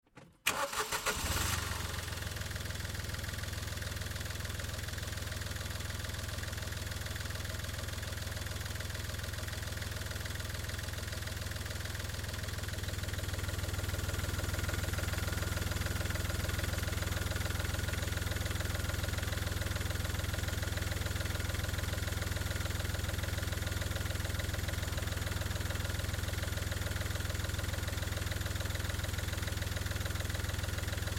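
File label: Car Smart Car Outboard Idle; 2005 Smartcar Fortwo; BigRoomSound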